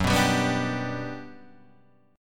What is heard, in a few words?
G Major F sharp, chord